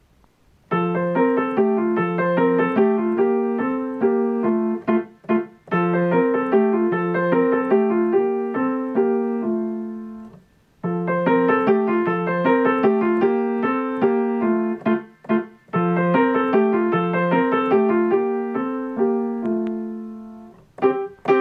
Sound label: village dance; wohlfahrt heinrich